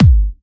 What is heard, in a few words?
Bassdrums UK Trance Kicks; Vengeance Samples